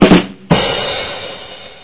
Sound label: Badaboom